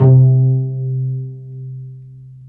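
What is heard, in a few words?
strings cello pizzicato, dry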